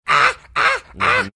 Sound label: making monkey sounds